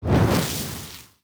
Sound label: molotov rag ignite; exp molotov